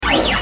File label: Servo